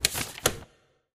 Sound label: tile flip; newsroom